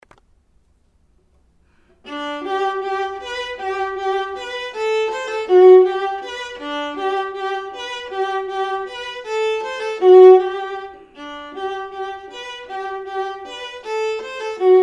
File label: Duet vla; melbay; Beethoven